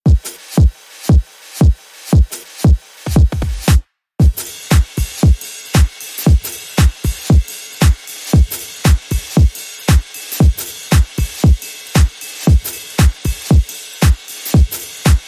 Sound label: Beat